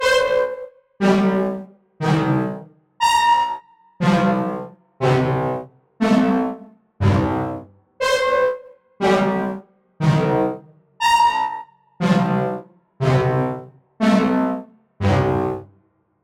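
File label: Syn Brs Stab, Gated Resonator, synth brass stab; feedback echo plugin; Newfangled Audio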